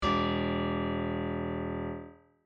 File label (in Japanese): ピアノ 42Hz